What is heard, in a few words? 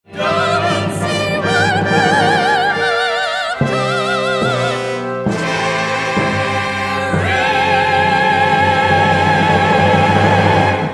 SOLO w orch LIVE; Jerry Springer The Opera; original cast album